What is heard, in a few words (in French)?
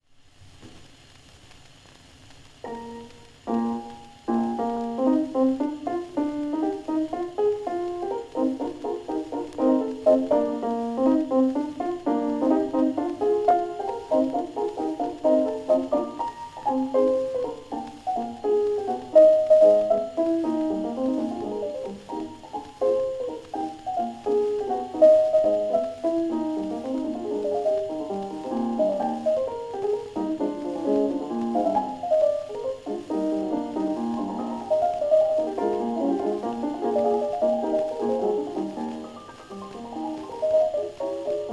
Valse Mignonne in E-Flat Major